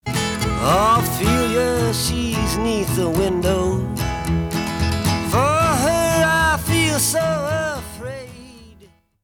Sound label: guitar overdub